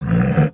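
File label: Sabertooth Approval; creatures; pets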